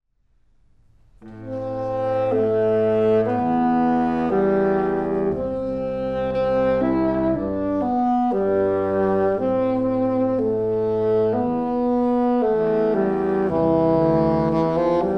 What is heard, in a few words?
Mezzo bass